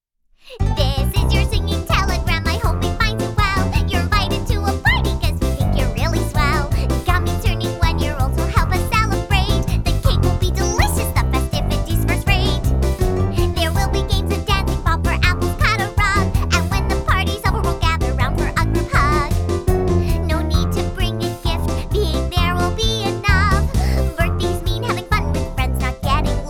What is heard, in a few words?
Singing Telegram